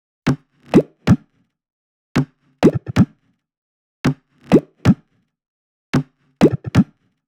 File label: 127BPM Kit; Vengeance Samples; Warhog Kit